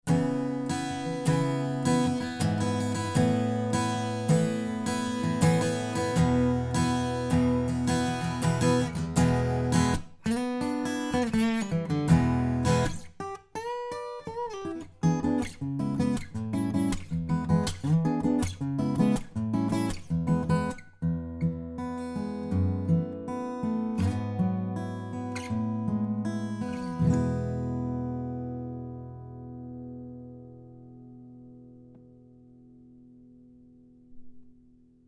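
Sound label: Acoustic Guitar 2 Mix Mic A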